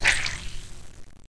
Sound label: splat; pixel zombies